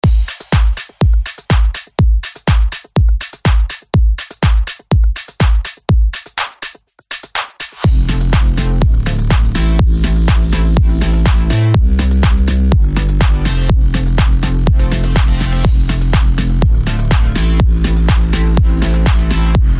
breaker 123 bpm

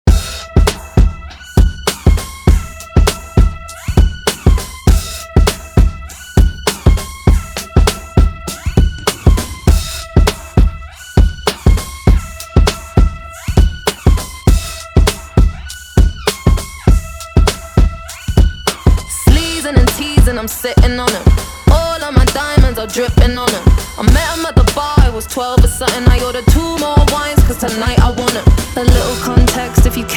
Extended 100 Bpm

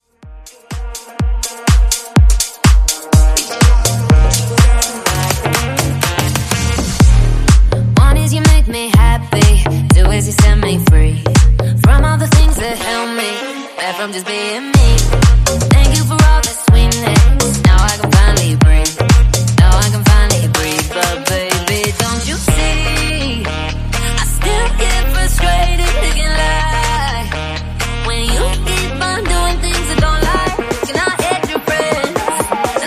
Top40 Slap House Mash

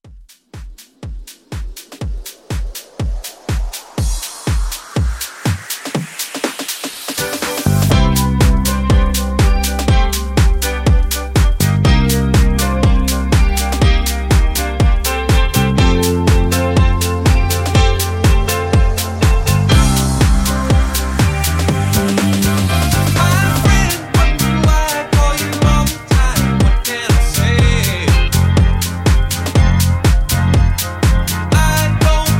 80s House Re Drum

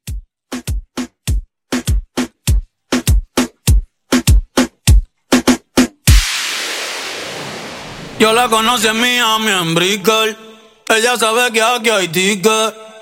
Reggaeton Acapella Drop 100

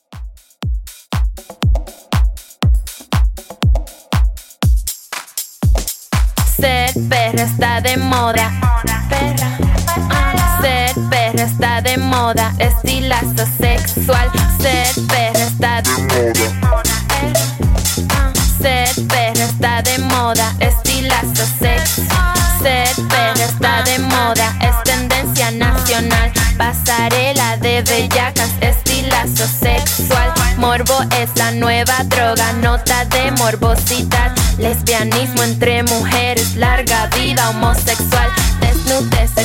Latin House, 120Bpm

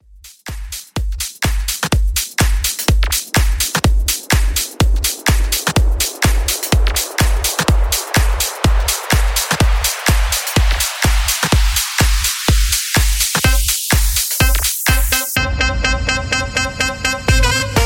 125 Bpm; Redrum

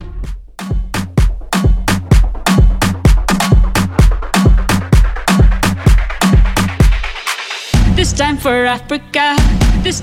Afro Beat; REDRUM

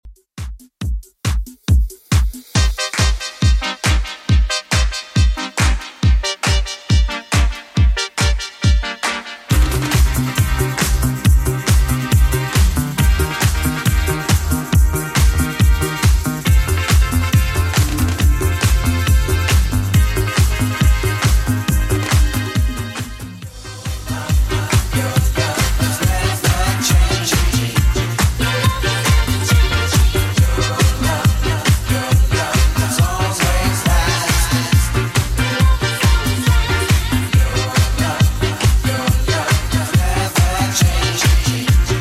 80s Redrum 138bpm

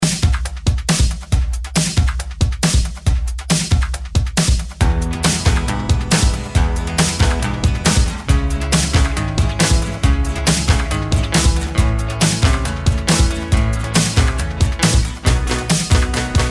ReDrum 136 Bpm; DJDOLLS

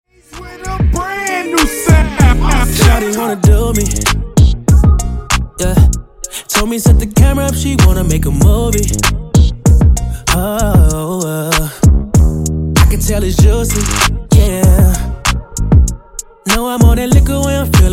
Hype Dj Intro Outro 96Bpm

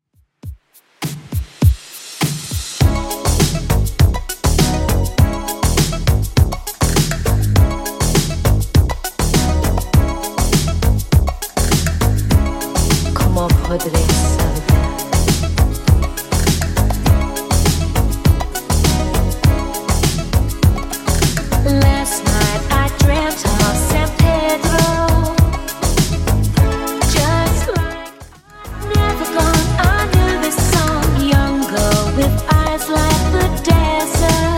80s Redrum 101bpm

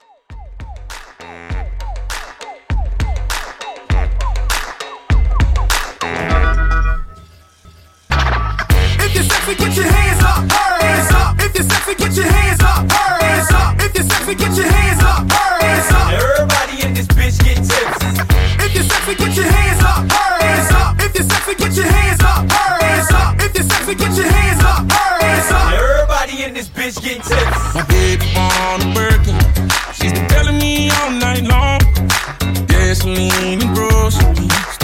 Balkan Hype Mashup, 100

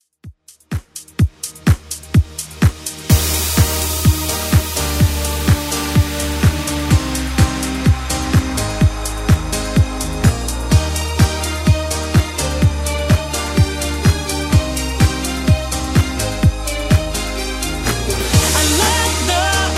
2Ks Redrum 126bpm

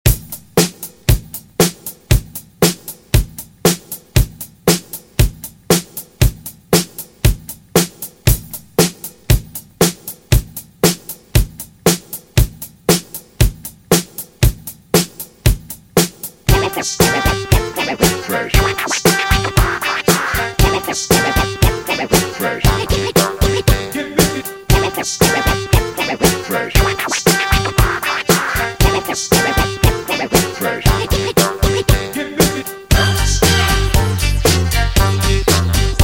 117 Bpm; Dj Intro Outro

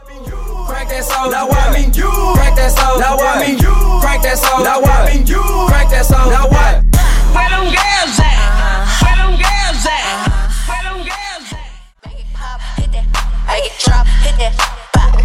Hip Hop RB Megamix

